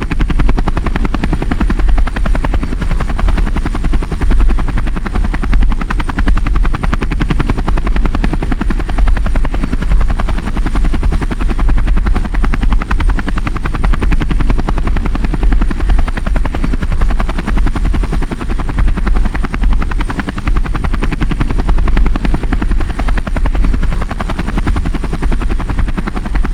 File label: generic engine, aircraft; Civ, Vehicles